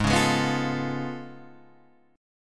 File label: A Augmented Major 9th G sharp